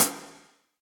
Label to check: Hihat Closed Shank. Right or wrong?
Right